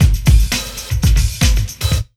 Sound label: ROCK 132, Big Beat Drumloops